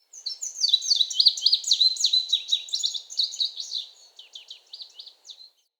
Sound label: Forest Open Glade Bird Med, Day